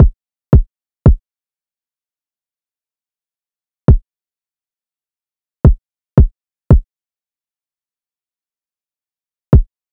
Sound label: Drum Loop 85 BPM Kick; Loop Stems; Cymatics; Vibes II Drum Loop Collection; Healing Drum Loop